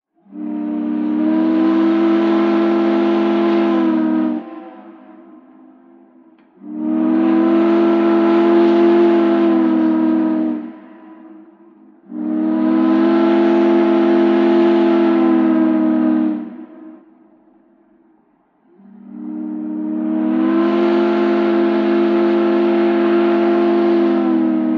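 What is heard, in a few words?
BOATS & SHIPS HORN: exterior: 6 medium blasts, 2 short blasts, echoey. Steam whistle.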